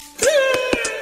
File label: Trumpet